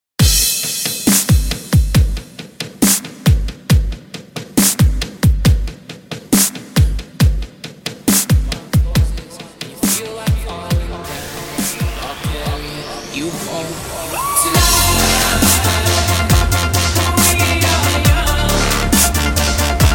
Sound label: Hip Hop Beat